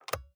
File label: clickdown